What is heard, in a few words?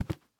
step cloth; STALZONE; minecraft